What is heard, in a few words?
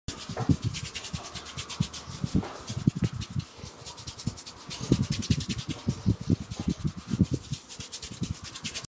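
head massage, asmr; ماساژ سر اسمر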